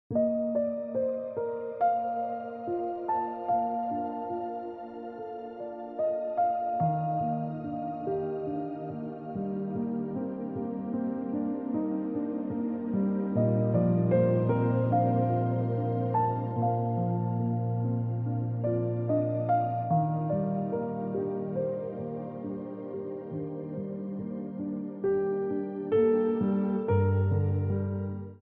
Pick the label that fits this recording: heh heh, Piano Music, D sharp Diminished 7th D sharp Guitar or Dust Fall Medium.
Piano Music